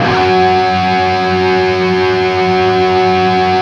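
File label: power chord F# loop cut, guitar; East West; Steve Stevens Guitar Samples Collection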